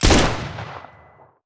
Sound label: pistol